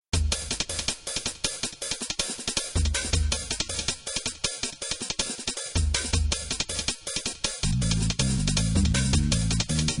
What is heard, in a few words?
rhythm